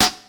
2000s studio snare one shot f key, modern snare drum